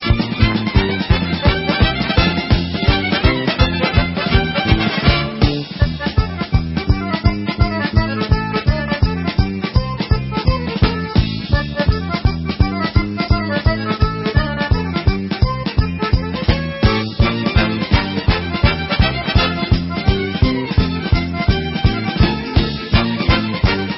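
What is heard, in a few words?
Instrumental, Israeli Dances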